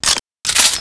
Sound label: sniper reload; gusanos promode